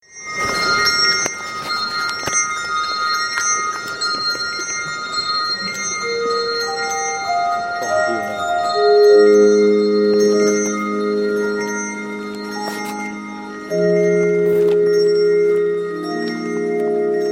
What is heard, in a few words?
Hymn with bells; Ecumenical 2017